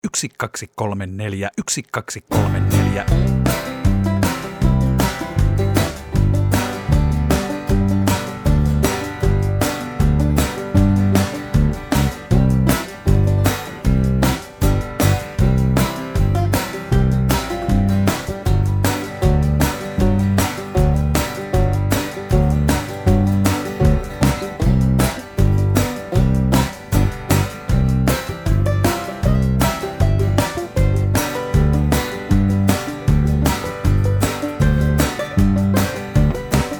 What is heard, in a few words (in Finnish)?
ilman laulua; alkulasku; laskujarjestys